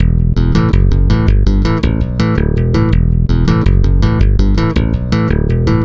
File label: Stick Loop, Stick Bass